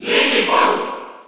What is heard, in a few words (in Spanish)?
Cheer Spanish PAL; Jigglypuff; SSB4